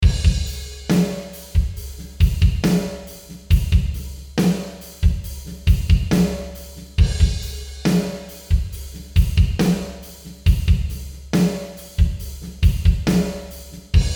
drums in warehouse

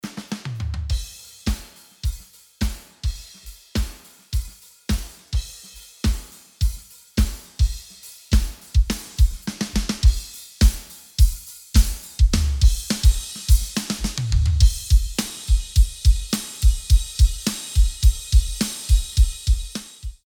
Drums; softube; pultec shootout

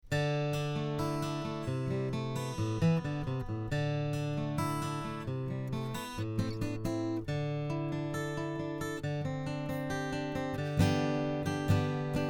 Stock plastic pins, acoustic guitar

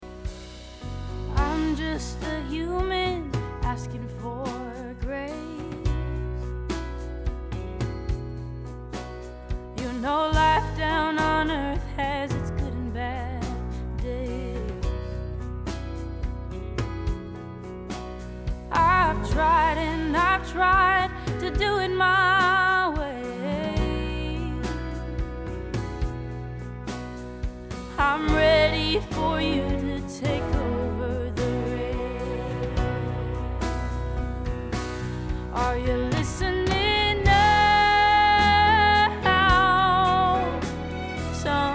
Track Telefunken U47, virtual mic

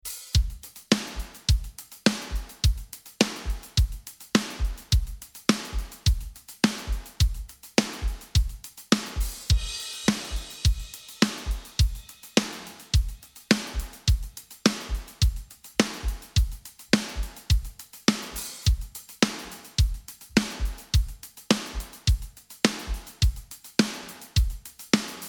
Solo Drums; SiXcomp; SSL; Six compact desktop mixer

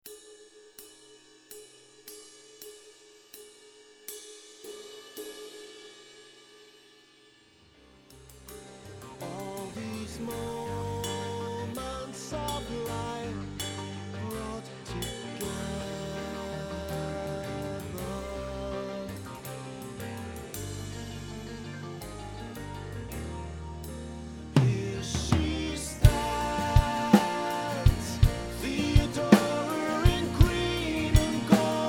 P2002 Ride 22, ride cymbal

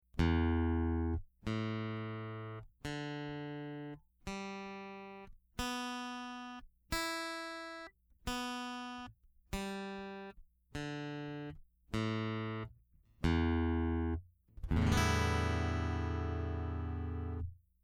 Stock DI single note, acoustic guitar

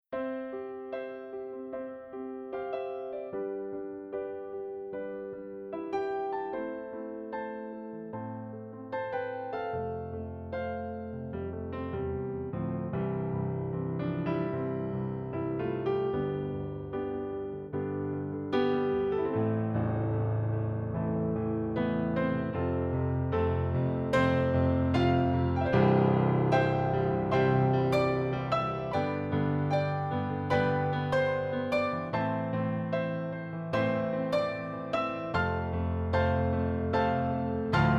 Nord Royal Grand 3D XL, digital piano

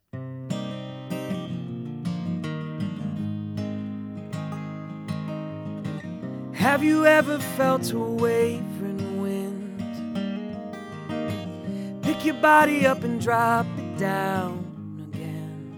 Music, M-Audio Cardioid; usb mic

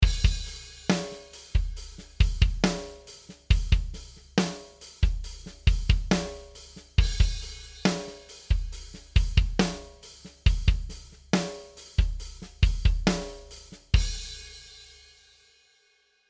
dry drums, snare

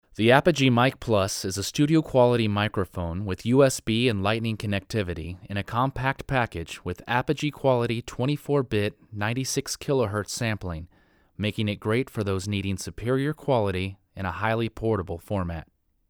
Voc Apogee MiC Plus, usb mic

